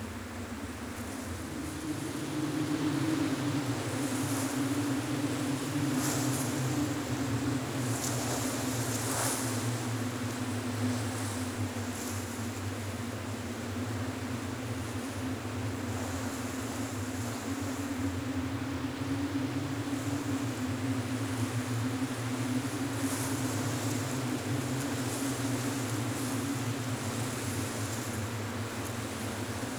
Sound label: wind low bushes; WAR